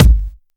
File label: Kick, Drum Hits